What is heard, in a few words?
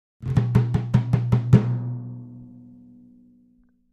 Timpani Hands, mallets timpani